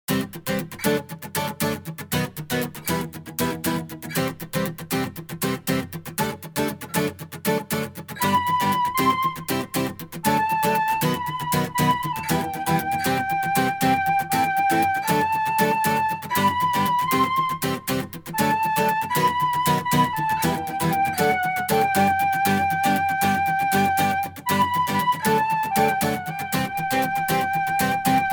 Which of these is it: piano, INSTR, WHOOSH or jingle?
INSTR